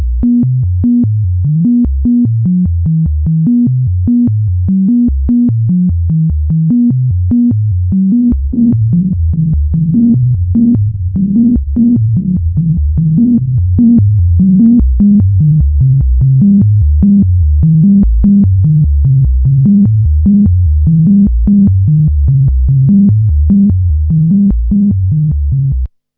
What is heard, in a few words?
ARP 2600, SEQ; TECHNO-BOX